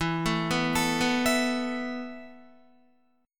E Suspended 4th E, down, Guitar, 4F,4A,5C,5G,5C,6E; Esus4 arpeggiated